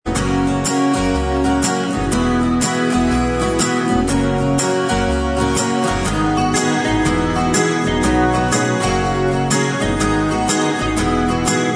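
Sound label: acoustic instrumental track, backing tracks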